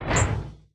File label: recycler mechanic; Factorio